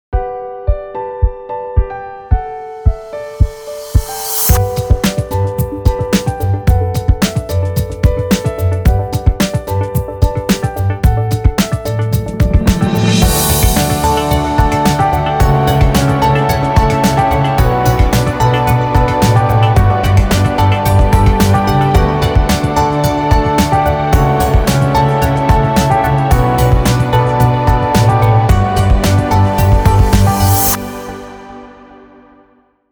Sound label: INSTRUMENTAL